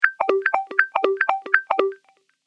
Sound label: Shuffle